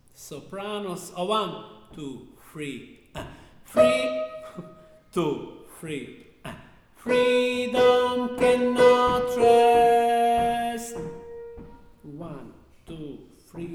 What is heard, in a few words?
Sopranos